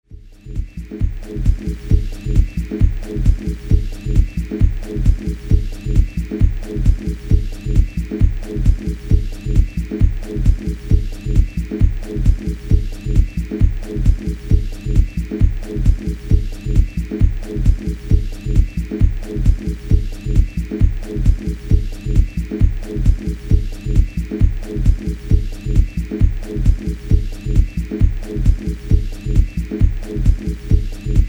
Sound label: Loop